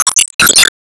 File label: Enemy Weakpoint Hit